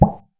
Boom

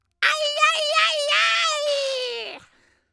Carnival Ayayay Shout

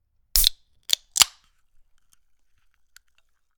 Soda Can

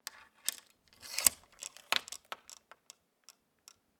Door Lock Slide Chain Rattle

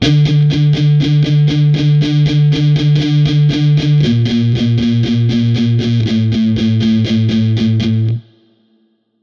Palm Muting

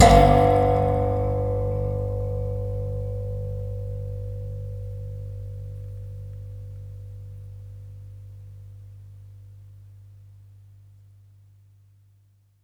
Spring

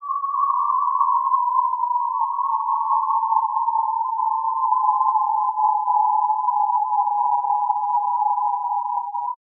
Eerie; Coagula Science

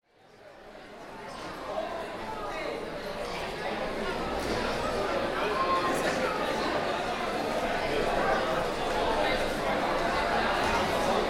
High School Canteen

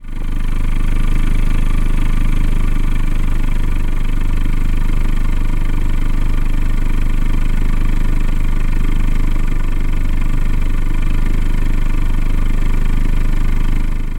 Exhaust Pipe Automobile

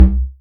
Boom

